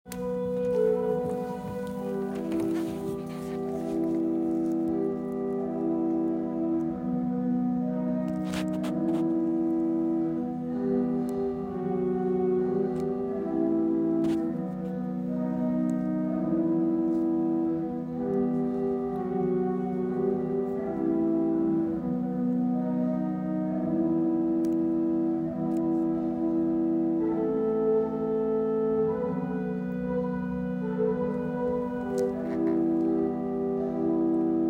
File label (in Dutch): orgel; westersede